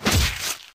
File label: Long Blade; Scrap Weapons; Zomboid